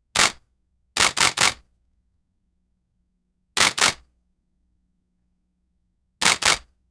5 kameras fotografieren, photography

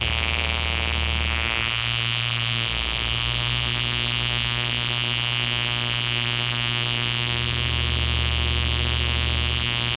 erstelle einen elektromot, locomotive

